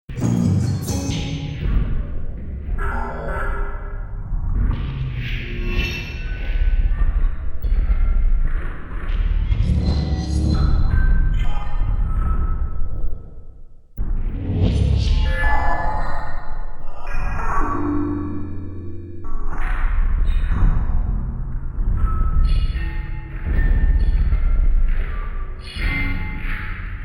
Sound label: switch bounce